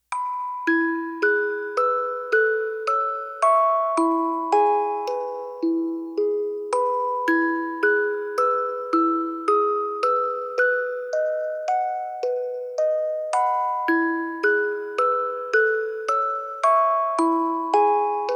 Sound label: Music Box Version; My Bonnie Is Over The Ocean; Tiny Tunes; Narrative Mind